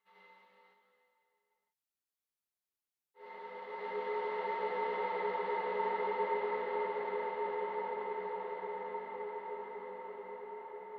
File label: Surround Dronetail